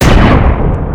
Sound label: Gun Shot; GMTKGameJam2020